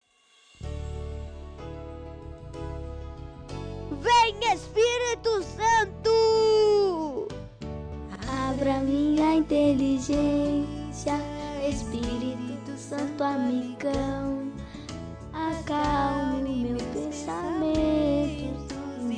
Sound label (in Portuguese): cantando com introducao